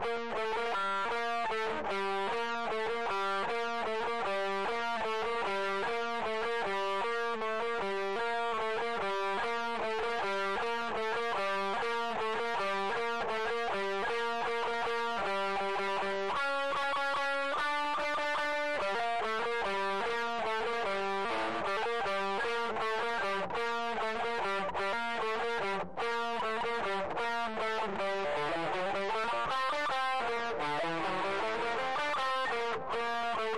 rhythm guitar

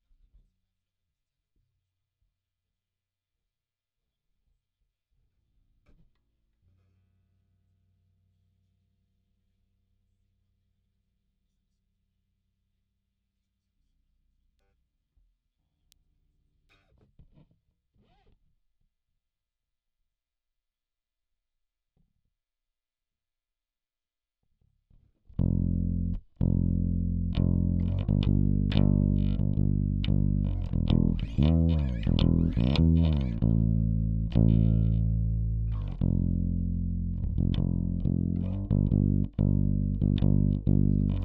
Bass DI

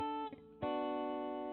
GTR R84

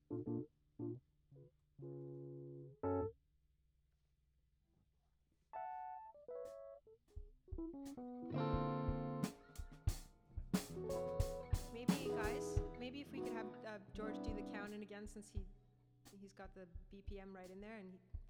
Rough Mix L

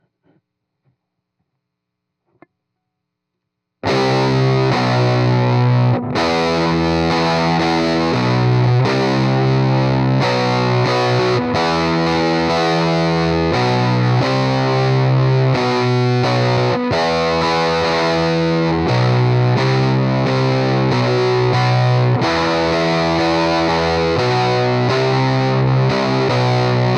Heavy GTR

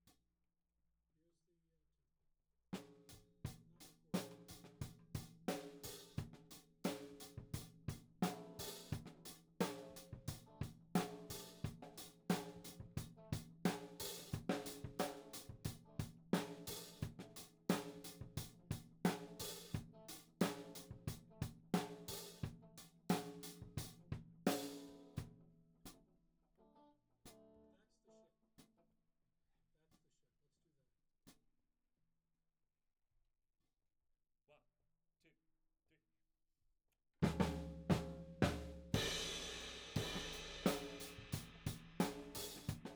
Tom1

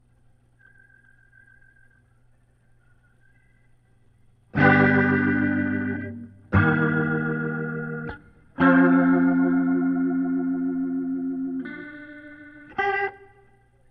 Leslie gtr

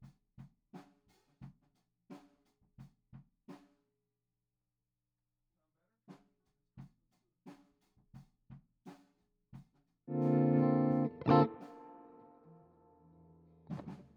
GTR R84

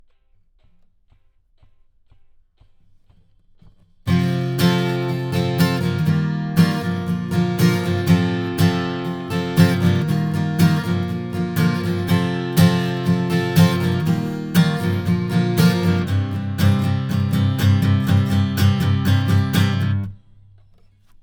Ac GTR

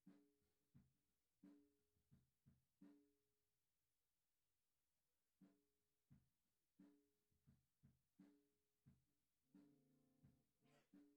GTR

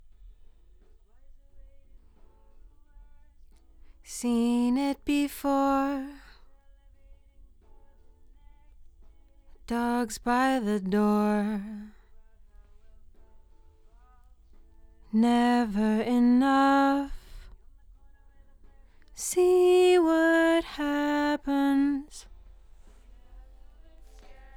LdVOX Double

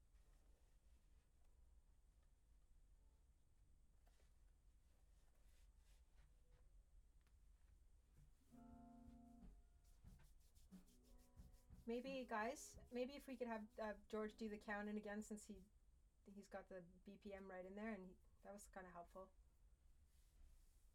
Act Guit L

